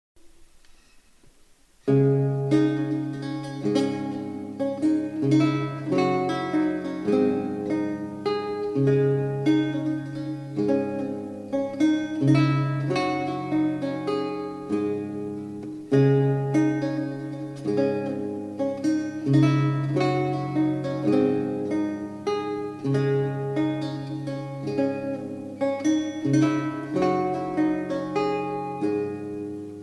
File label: menuet